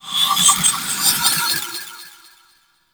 Bark; Enemies; Warframe; Vomvalyst; Teralyst; DE

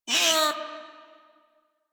Feral Vocal Pain; Warframe; Catbrow

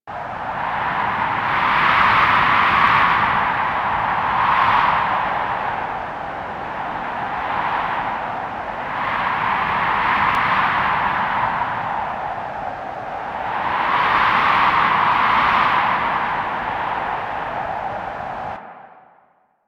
Cold Edge Wind Amb; Warframe; Ambience; Corpus; Crp Exterior

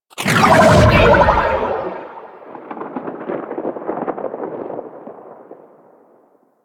Insect Liset Fly By Quick, Vehicles; Liset Flight

